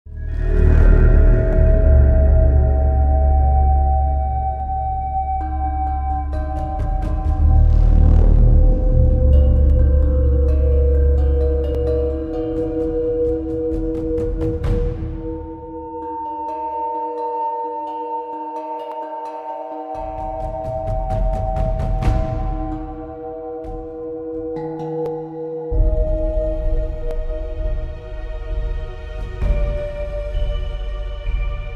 Ocean Battle Music; ARK Survival Evolved

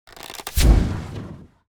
Ranger Rapid Reload

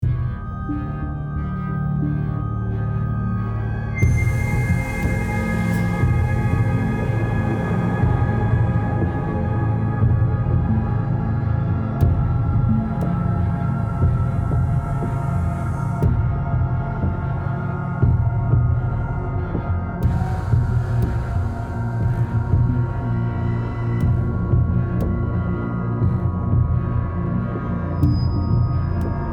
Stealth loop

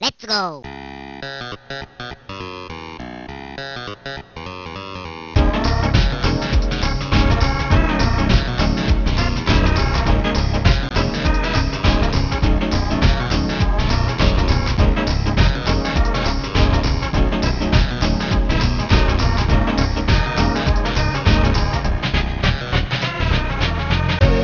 Solo

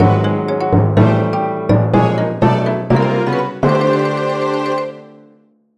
Devil Jingle; Brain Age Concentration Training; Nintendo 3DS

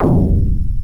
Door Close; Sword of Vermilion; Genesis

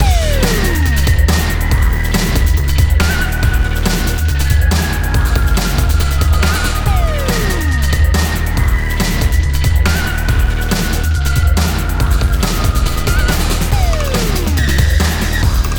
Player Leads; PlayStation 3

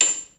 bonus Meter Full; gamerip; temple run

